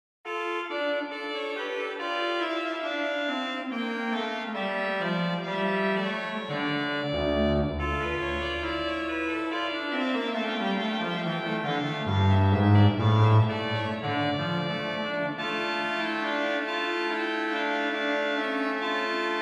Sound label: Messe Noel, OrganMusic; Fessy & Mine; Souvigny